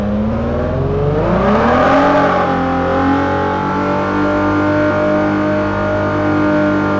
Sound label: rev, vehicles; TDMCarpack; TDMCars